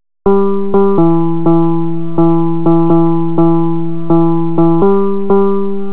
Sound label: tune